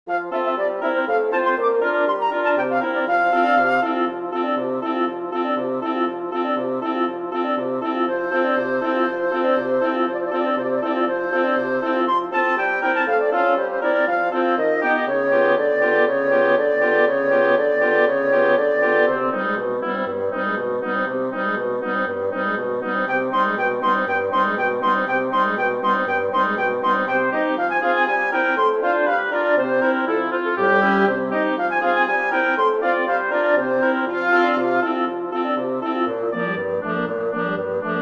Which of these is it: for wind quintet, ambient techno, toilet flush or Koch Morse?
for wind quintet